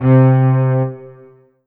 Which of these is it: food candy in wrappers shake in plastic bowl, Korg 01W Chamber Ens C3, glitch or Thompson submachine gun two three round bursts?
Korg 01W Chamber Ens C3